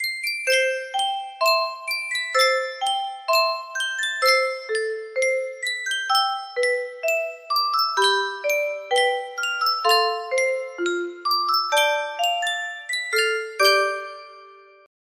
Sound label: Jokiwa music box